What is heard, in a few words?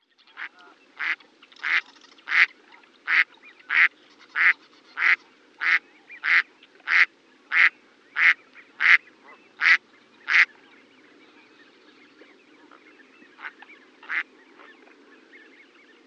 Mallard Quacks Duck Quacks